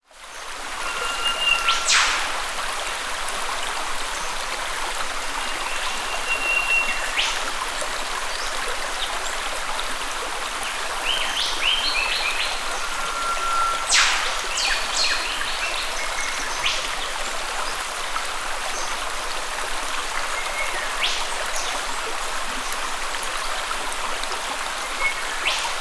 nature rainforest ambience creek birds eastern whipbird; zapsplat